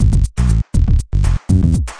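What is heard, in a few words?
120 bpm bass n clappy drums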